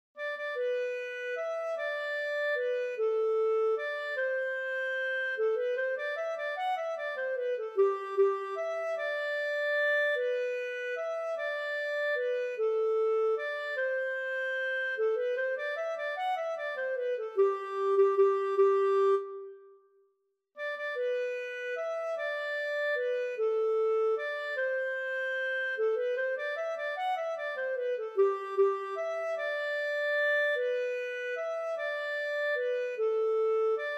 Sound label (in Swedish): Vals